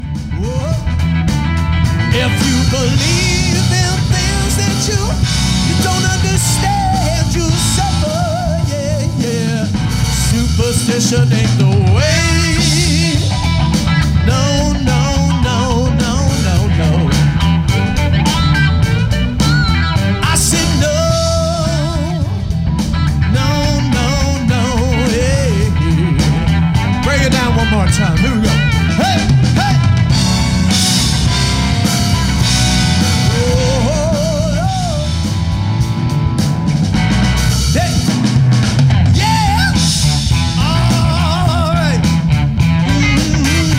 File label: Funky Music